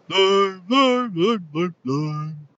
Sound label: jingle; Slimeoos Odyssey; godot